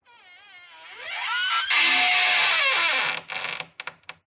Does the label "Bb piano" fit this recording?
No